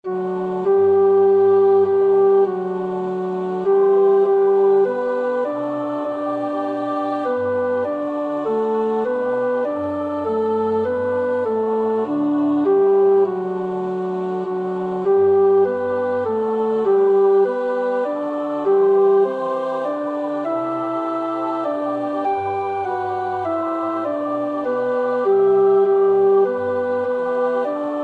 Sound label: Abschiedslied tenor; Brahms (Johannes)